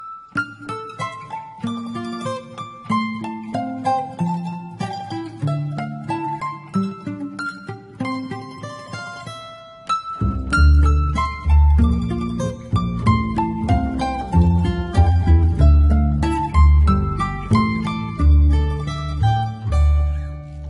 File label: Soft Ringtone For Android; ringtones